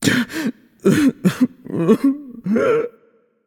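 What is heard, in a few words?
nonverbal boohoo; voicepack; smite; merlin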